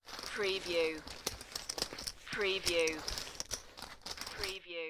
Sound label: Poker Chips and Cards Moving on Felt